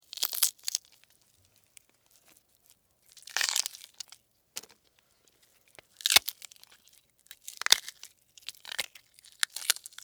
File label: Insect, Eat, Chew, Bite, Bug; Insect Eating Sound Effects; Insects Eating (Sound Effects:Animals:Insects); Blastwave